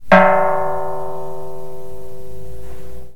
Buddhist gong; memory-sound; gcompris-qt

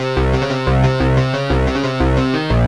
rave bass; lmms

